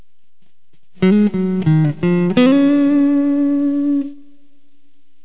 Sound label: blues